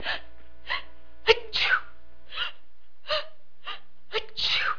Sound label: people